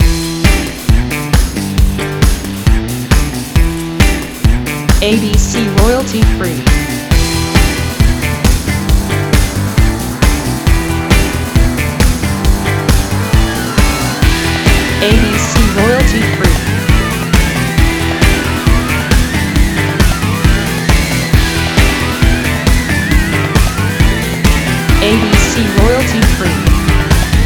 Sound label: Gym Rock